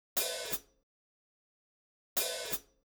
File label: Sabian Hat Swish; hydrogen